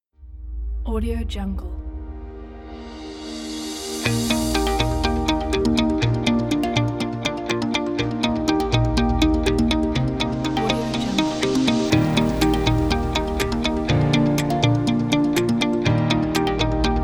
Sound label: ambient inspirational corporate